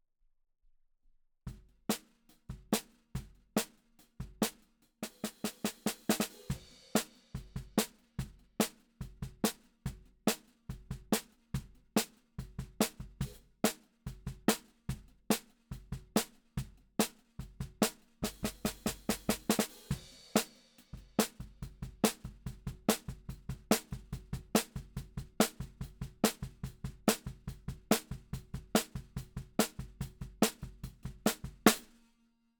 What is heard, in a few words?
Snare Bot